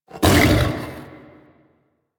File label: Melee Grunt A; Enemies; Vocals; Warframe; The New War; Archon; Amar